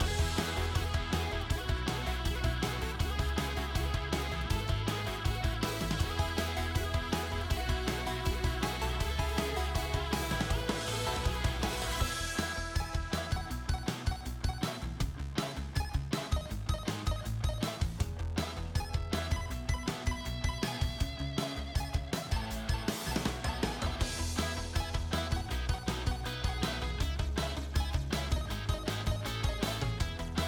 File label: music menu; Ben 10 - World Rescue